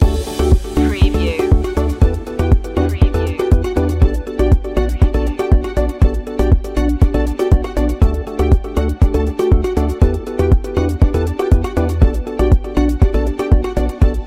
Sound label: communication beacon loop